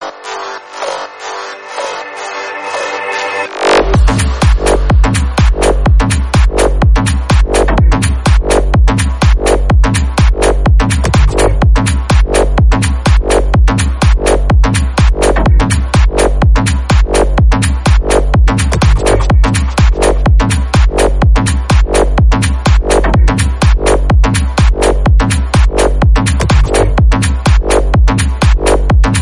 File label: super upbeats music mix